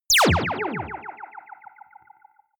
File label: single blaster; soundpool